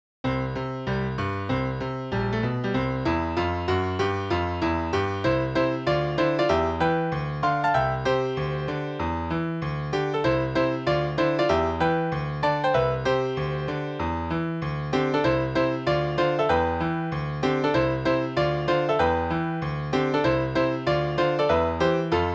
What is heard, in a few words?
Holidays Piano